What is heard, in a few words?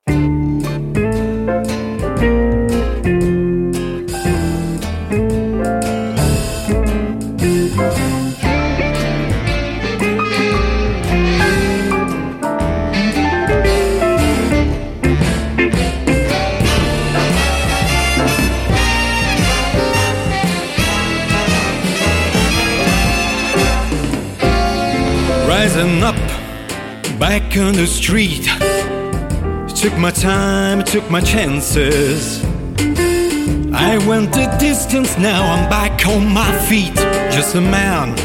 classical jazz; Mixing and Mastering Samples